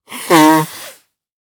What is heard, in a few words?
Human Male Nose Blow Short